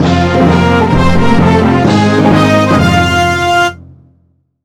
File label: Jingle Level Clear; heracles battle with the gods